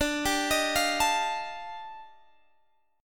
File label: Eb Major Sharp 11th D